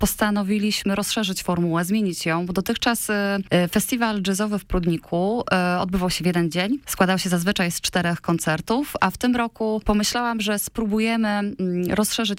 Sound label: jazz; doxacafe